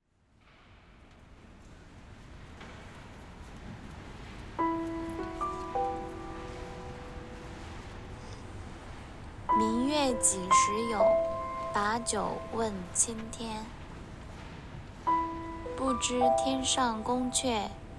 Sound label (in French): La demande en Mariage chinoise avec piano